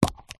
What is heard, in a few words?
household aerosol deodorant can plastic lid off